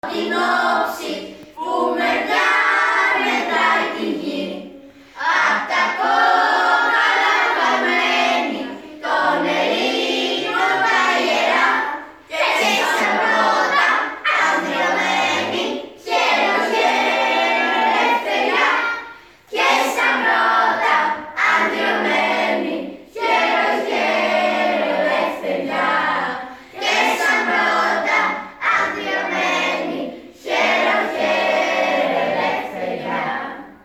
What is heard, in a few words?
anthem; epeteios 28is oktovriou 2021